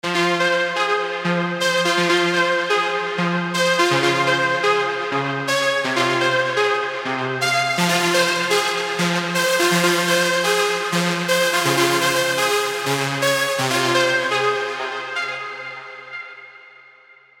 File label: Serum 2 melodic leads; Baby Love Cry; Revealed Serum 2 Melodic Leads Vol. 1